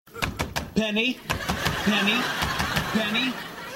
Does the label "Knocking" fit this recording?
Yes